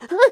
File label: effort; Scrat